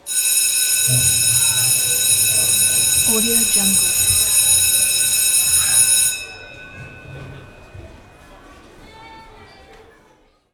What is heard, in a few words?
bells school bell interior high school dismissal; زنگ تفریح مدرسه